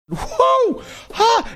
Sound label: making pained sounds